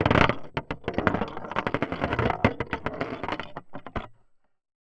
Corrigated Iron, contact mic, Metalics

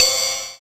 CYM RIDE, Dance Kit, Roland

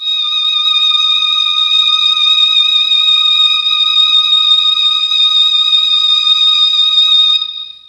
D#4 3 violins, Mellotron MKII; Mike Pinder - Mellotron Archive CD

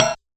Realistic Concertmate-670 Kit Hat; One Shot Kit

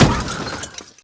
Crush; Yamaha DTXpress; Effect 1

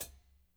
HAT, KLANKY KIT

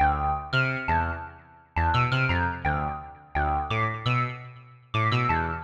reason syn; neuro; Sinistarr

